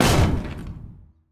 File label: impact tank